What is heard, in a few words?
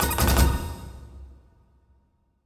Sfx Ingame Invicibility End